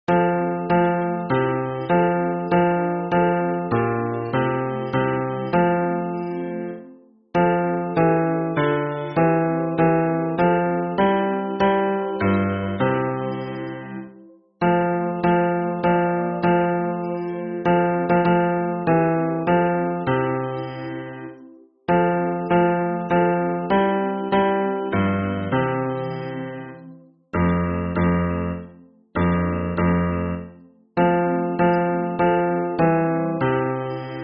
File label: hymn bass